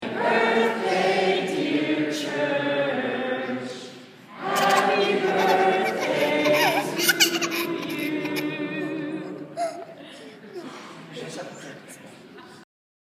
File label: kids happy birthday church